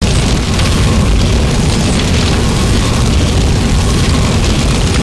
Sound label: gunstand flamethrower fire loop, turret; gow; turret judgement